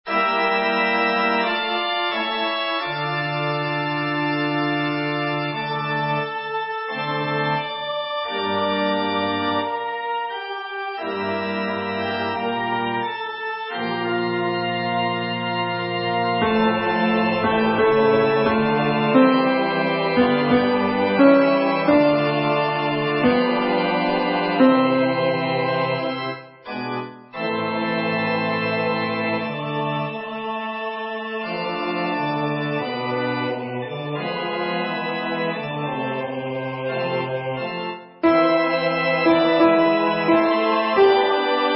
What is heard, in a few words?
Agnus, Alto; Schubert Mass G